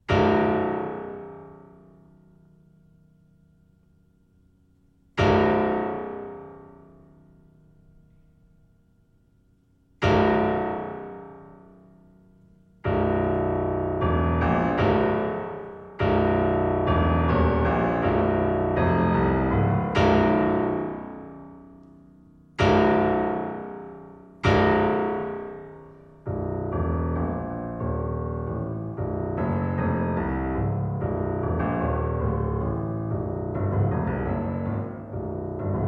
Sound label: duo for violin and piano